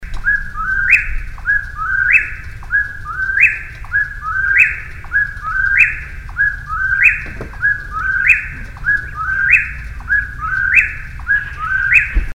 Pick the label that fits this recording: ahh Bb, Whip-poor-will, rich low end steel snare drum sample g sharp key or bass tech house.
Whip-poor-will